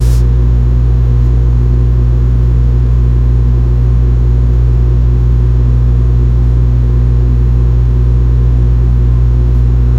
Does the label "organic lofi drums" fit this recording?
No